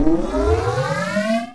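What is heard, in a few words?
laser charge; Window of Retaliation; weapons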